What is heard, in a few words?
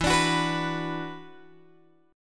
E 7th Suspended 2nd, down, Guitar, Open G